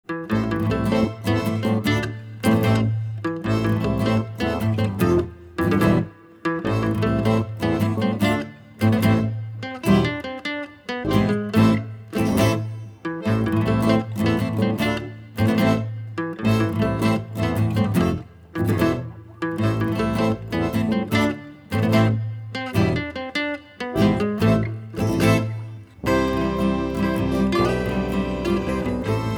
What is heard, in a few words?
A Minor Tango